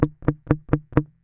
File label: Bass Pick Mutes, Electric Bass One Shots; Slate Digital BASSHOLE Sample Pack